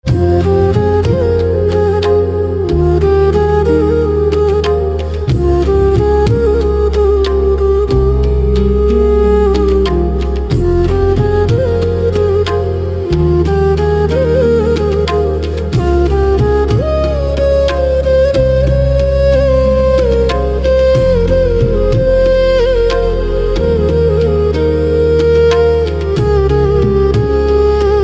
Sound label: Flute Classic; Awarapan 2007